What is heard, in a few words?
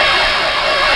Weapons Slinky loop